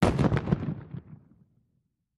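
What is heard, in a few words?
2 Oz Black Powder Bomb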